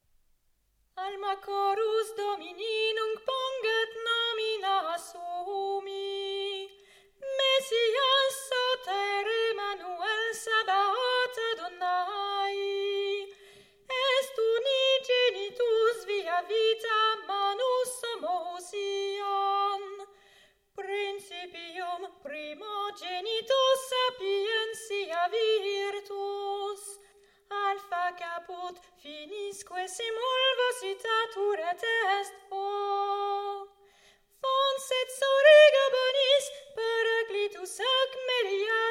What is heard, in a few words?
chorus